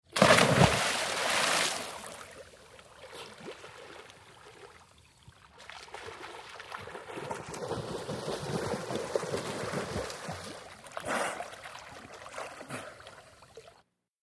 Splash Dive Swim

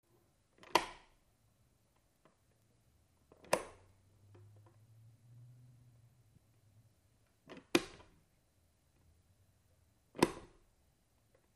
Light Switch Pushbut

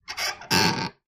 cabinet door screech; cabinets

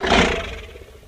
Dinosaur Growl Exhal

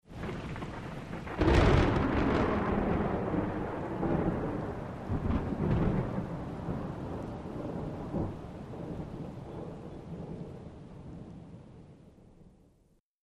Rolling thunder with faint rain

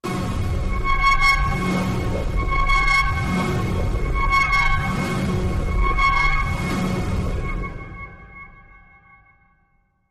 Orchestra Scraper Mac, metallic

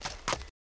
Horse Hoof Step On Gra

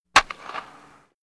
Baseball Bat Wood Hi; baseball fx & games

